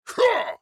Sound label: Attack Medium; Black Adam